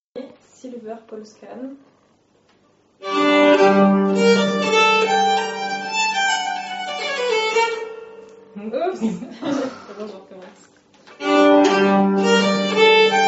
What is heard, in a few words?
Rapide, nyckelharpa